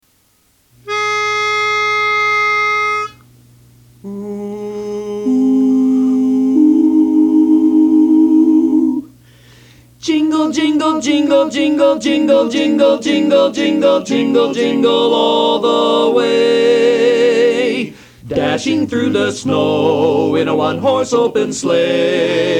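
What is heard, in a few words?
Jingle Bells, Lead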